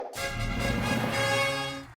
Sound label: LEVEL UP; BLOX FRUITS